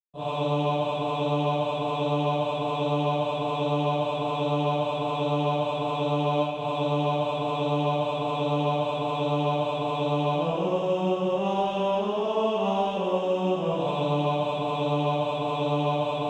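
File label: bass, Practise Music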